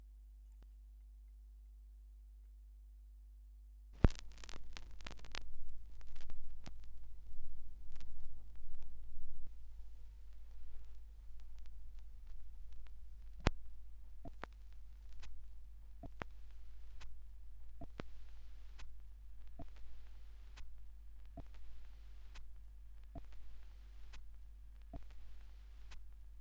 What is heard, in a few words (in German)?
schallplattengeraeusche